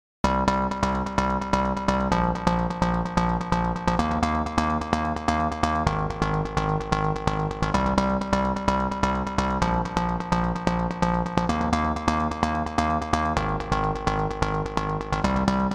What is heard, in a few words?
Bass